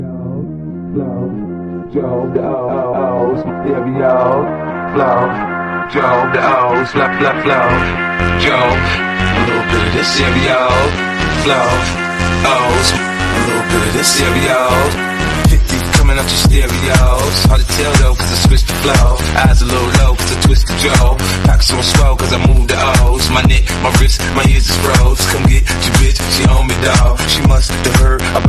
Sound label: English Ringtone